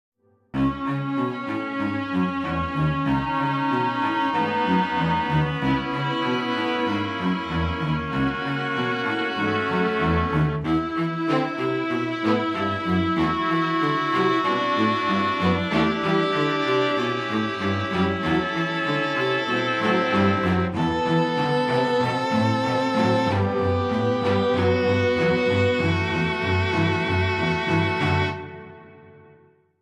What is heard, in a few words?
AMBIENCE SPEAKER